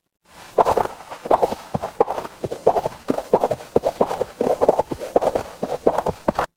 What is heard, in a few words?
footsteps snow